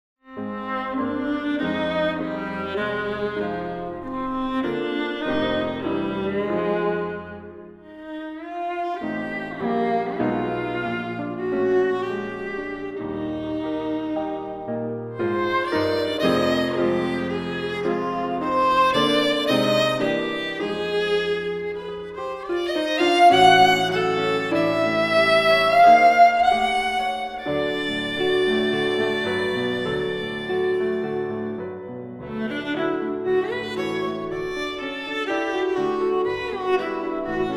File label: vla w piano; vla prokofiev